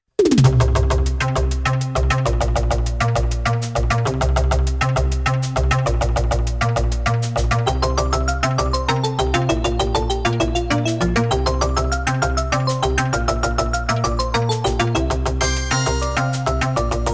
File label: BGM